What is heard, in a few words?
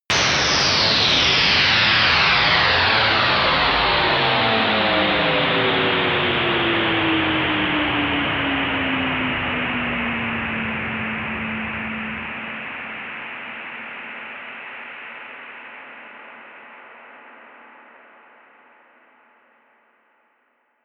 huge slow down